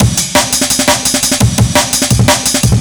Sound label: amen